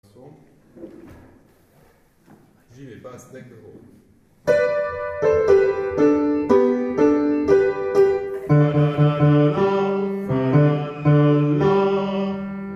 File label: Basses; DECK THE HALL